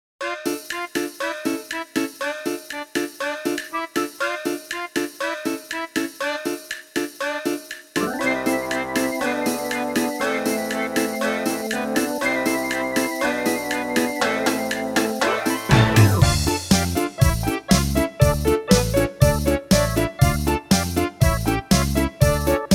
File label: casio ctk-6200 seq